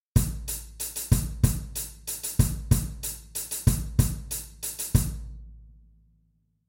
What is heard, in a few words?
cymbal